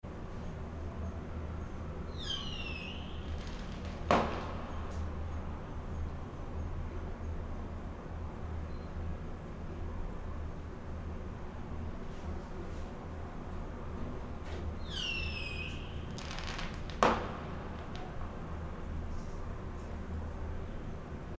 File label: Bunker Inside Bottle Rockets Stereo; Turtle Hill